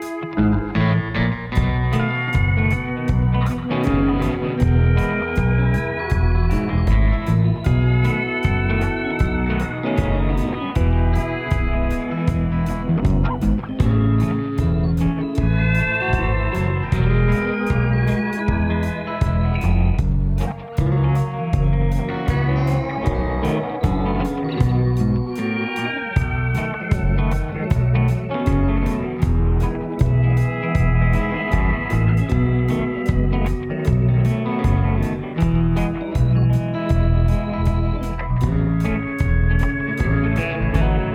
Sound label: Diner Music